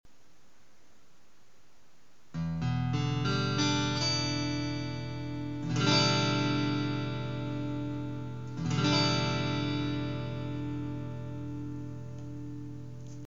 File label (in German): e moll7, guitar